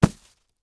snowball hit

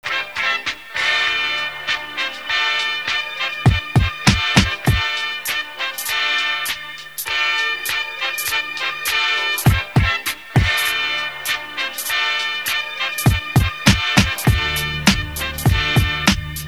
radio music

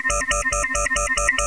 wep wep, ambience

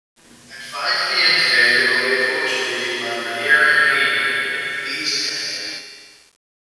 rebar intercom, ambience

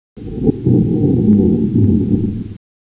engine; rebar

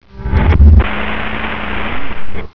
meteor impact; ambience

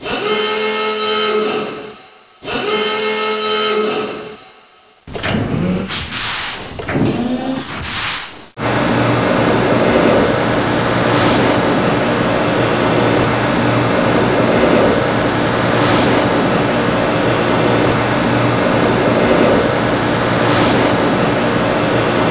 machinery

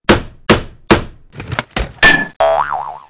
crafting

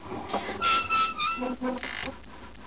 swing

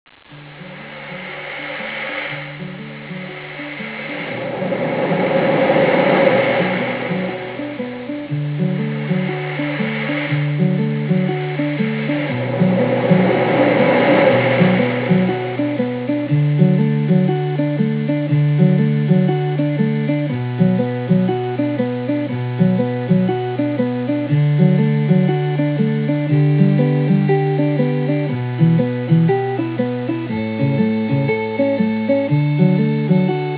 hawaii song; nolf

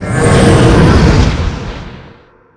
ghost dead

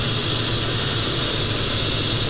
fridge; ambience; rebar

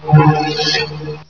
energy ambience

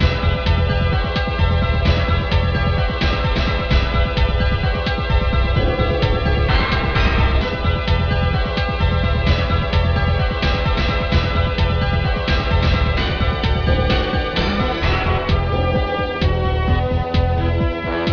music complex; goldeneye

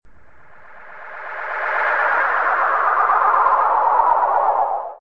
ufo

